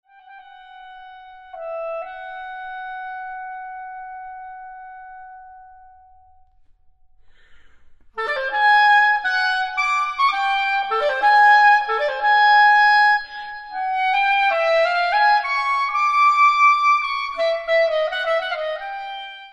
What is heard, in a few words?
for B Flat Clarinet and Piano I. Recitative; Orpheus Singing